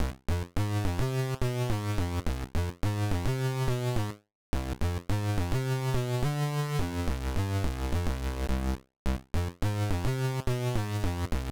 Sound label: buzzzy synth (106 bpm)